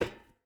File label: footstep boots metal run; Rust